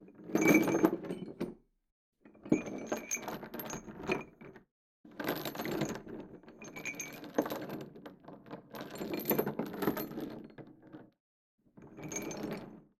Heavy Chain Foley On Wood; Metal Chains; Conor Bradley

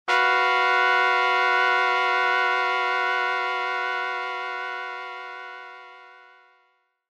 surprise

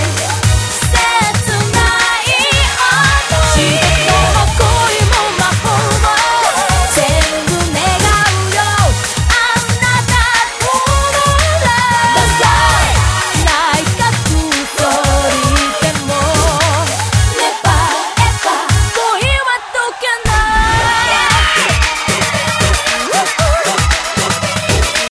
Royal Chocolate Flush

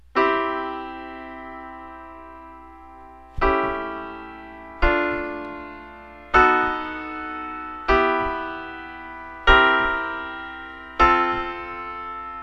Secondary Dominant Seventh Chords In C Major

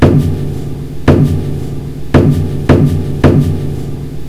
Reconstruction Of Turkish Stop On Early Pianos

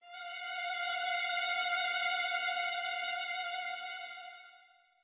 Sound Of Fear

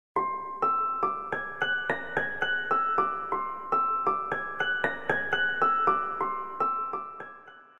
Harp Dreams

